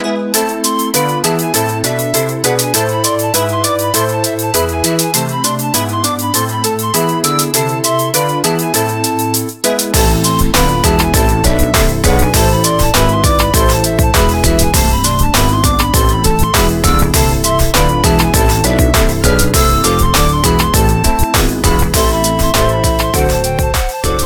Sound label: sonata a minor 4th movement; Telemann’s Sonata A minor 4th movement